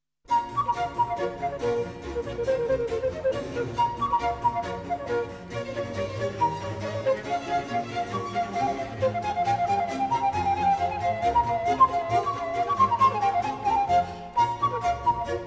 flauta travesera

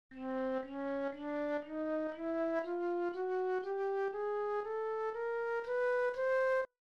cromatica

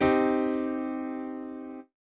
Perfecto menor

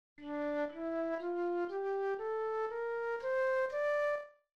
escala

